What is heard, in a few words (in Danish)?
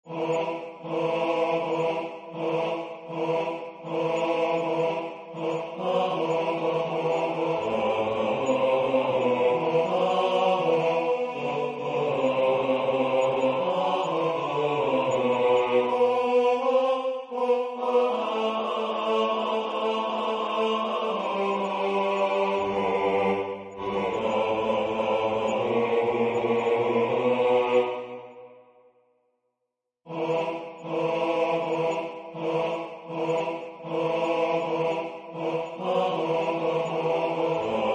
bas; Der Panther